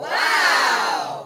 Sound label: reaction